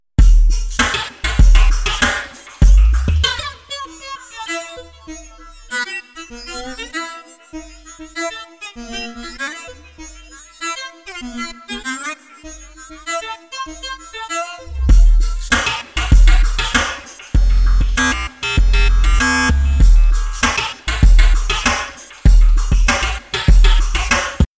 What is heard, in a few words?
V-Synth